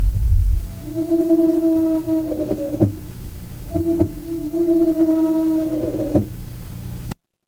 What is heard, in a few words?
horror creak